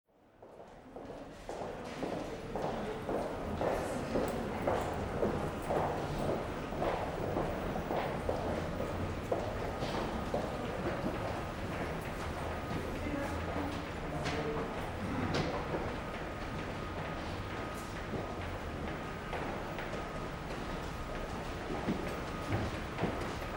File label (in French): pas presses; portee ferroviaire